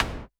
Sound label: snare, Drum Machines, Clavia Nord-Drum; 300 Drum Machines